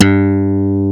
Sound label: funk mid G2, digital bass; Music Store - Claudius Diemeter Schwerlos